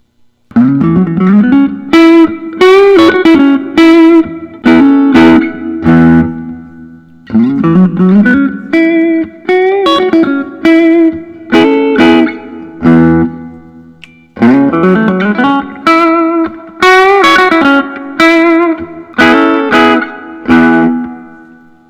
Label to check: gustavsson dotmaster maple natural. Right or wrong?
Right